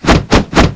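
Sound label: zombie stab; aksha zombi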